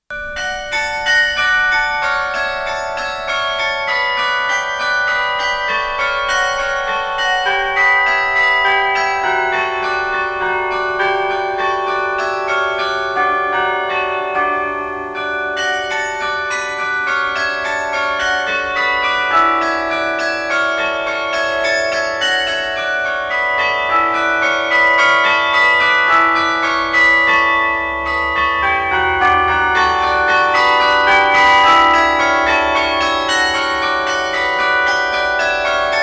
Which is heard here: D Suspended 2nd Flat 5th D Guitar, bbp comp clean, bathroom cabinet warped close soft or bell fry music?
bell fry music